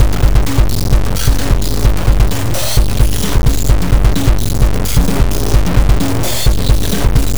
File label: Beat, Drums, Eclectic Electronica